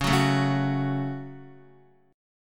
Db Suspended 4th Sharp 5th Guitar 4E,4A,5D,5G,5C,6F; strummed; JGuitar